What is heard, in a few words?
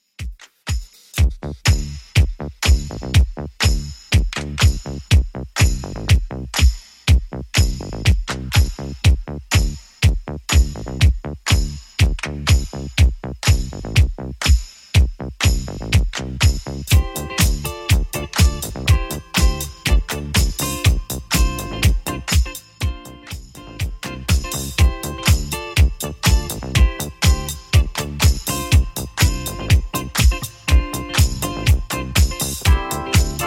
70s Redrum 119bpm